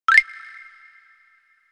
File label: Lose Air; Banjo-Tooie